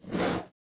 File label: Spell fire